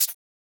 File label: Shaker; FLPRO